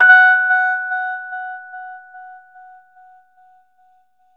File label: Rhodes octave F#, R; 3D Sound; 3D Keys VOL-1